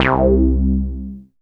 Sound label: BASS, Analogon